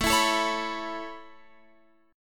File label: A Major Add 9th A Guitar Open F, chord; x,16,16,16,16,18; Aadd9 strummed